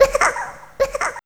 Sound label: LAUGH